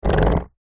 household chair sofa drag concrete wooden legs scrape